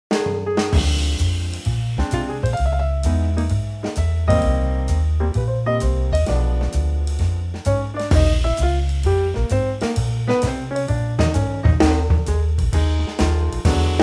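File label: trio A flat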